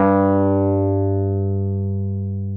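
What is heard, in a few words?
WURL EP G2, Wurlitzer electric piano; Sonic Reality; Vintage Timetraveler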